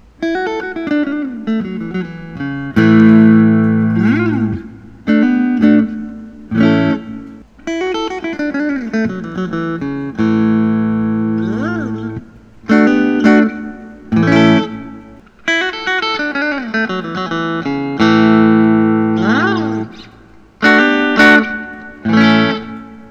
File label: fender telecaster blonde